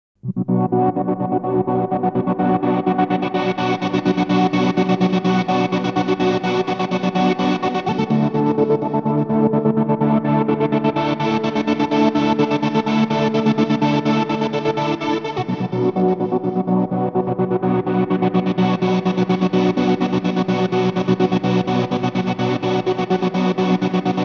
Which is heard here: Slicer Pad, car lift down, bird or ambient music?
Slicer Pad